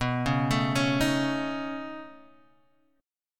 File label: Db 7th Suspended 2nd Sharp 5th B, chord shape